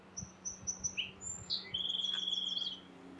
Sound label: song sparrow